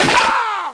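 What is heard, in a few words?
Metal Flash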